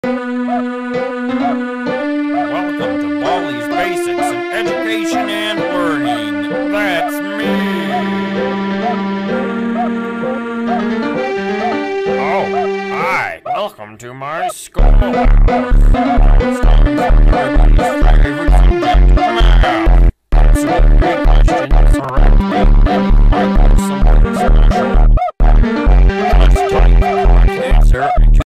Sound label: funk; automotivo; baldi; tik tok